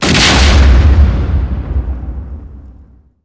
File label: boom